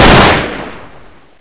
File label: shot; suicide